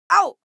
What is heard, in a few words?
ow exclamation of pain